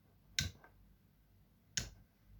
Turning On And Off A Light Bulb

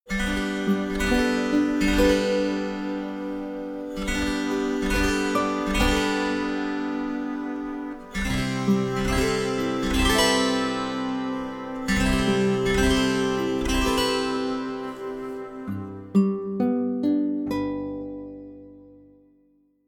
Celtic Positive Intro

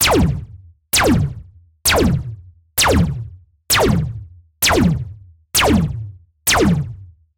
Gun Blaster

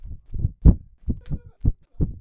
Wooshing Noises

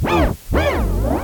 Old Sick Organ

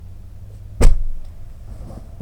Body Fall

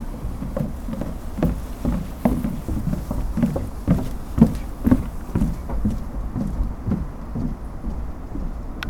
People Walking On A Boat

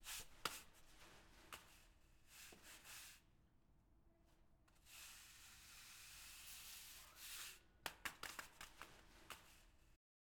Broom Sweeping

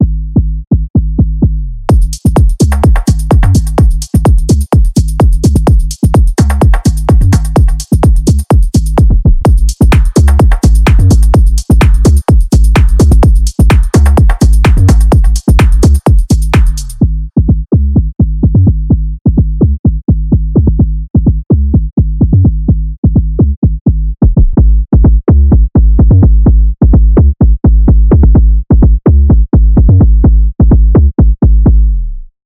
Tech House 808 Bass Loop

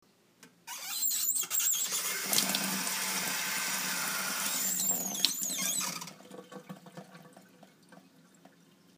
Squeaky Faucet

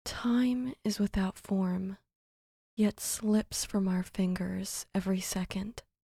Female Vocals

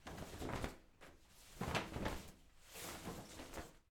Waving Flags

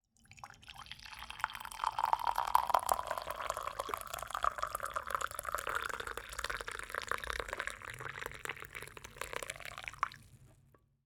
Pouring Coffee

